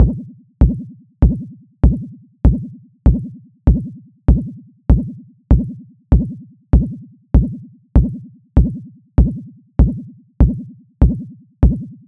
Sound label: delay kicks cr78 wet; aas; objeq delay